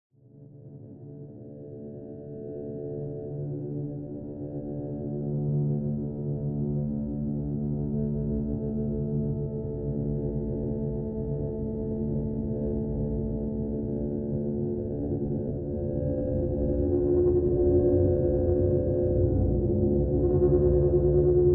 String D; String Redux; Dean Valentine

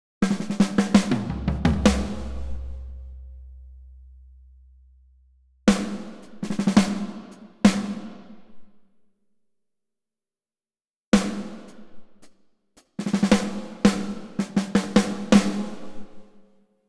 Drums Recording; House of Radio Ukraine

